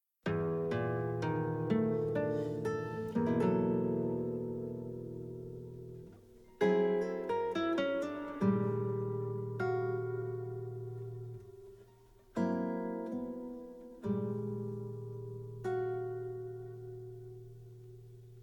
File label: Guitar